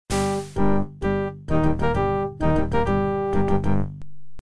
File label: miditest f4 op fm